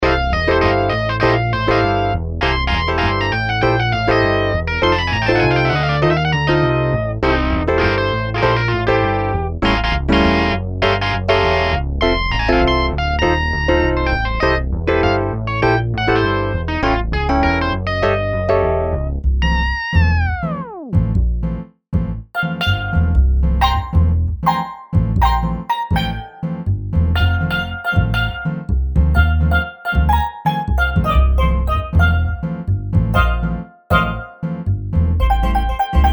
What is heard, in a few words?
MIDI blues; spellman studio